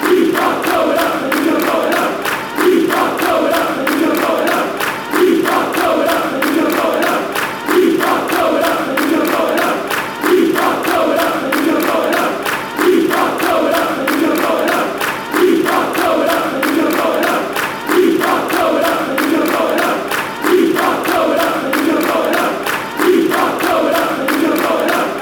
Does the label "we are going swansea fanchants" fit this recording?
Yes